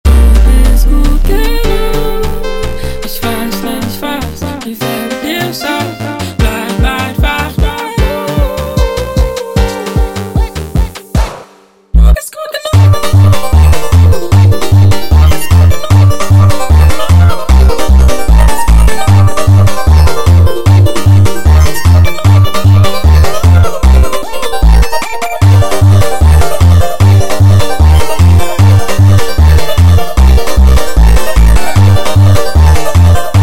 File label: Funk sped Up, funk